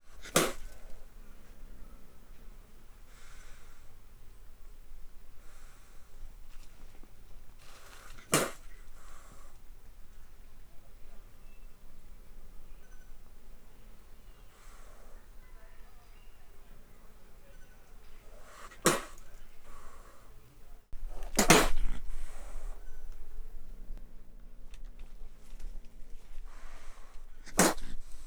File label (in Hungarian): szárazat köp és fúj, vadmacska; állathangok; miskolci zoo 2009